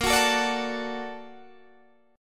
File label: A sharp Augmented Major 7th A sharp Guitar DADGAD